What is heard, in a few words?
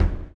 STOMPS; Spectrasonics; Vocal Planet; Blues Soul